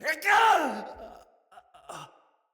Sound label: Death, Soldier Male; Battle; Barks; Dialog; Dendrit